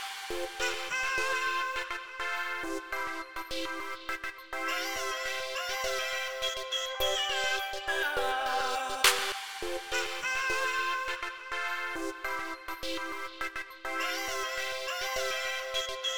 Comedyhero Melody Loop; bakugan champions of vestroia 2020; switch gamerip

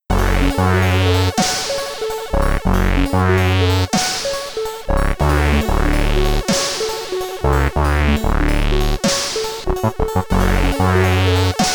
Loading Tune; B-Bobs; commodore 64

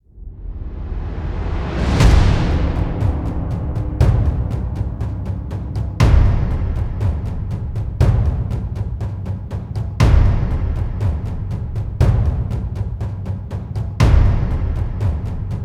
Taiko Drums; Oriental Empires

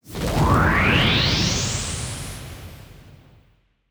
summoned

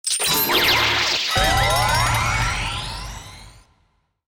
sword bright loop

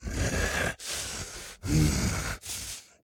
Walk Cycle Burns; The Town

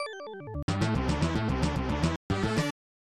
Victory Tally; Spider-Man: Lethal Foes; SNES

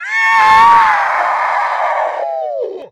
knockout

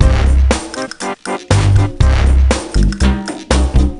Flourish; Happy Feet Two; NDS

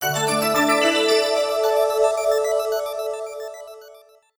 Jingle Collection Complete; StreetPass Mii Plaza; Ultimate Angler

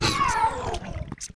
Monster Death

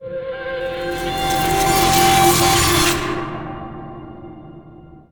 Overlay Search; battlefield hardline; ps3 ps4 windows xbox 360 xbox one

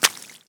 run water

